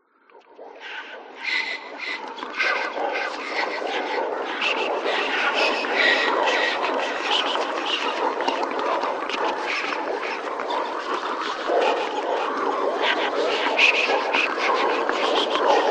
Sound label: horror whispers ghosts nightmare